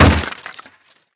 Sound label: wood plank break